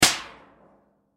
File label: Impact Metal Sheet Metal Hit Small Piece Rattle; Blastwave